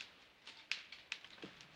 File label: Creak wood little Loop